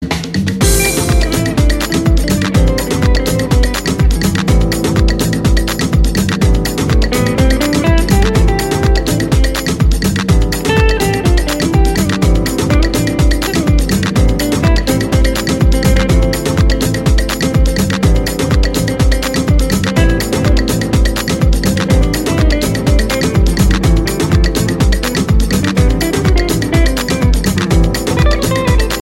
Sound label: background music tone